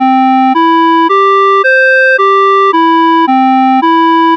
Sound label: synth wavefold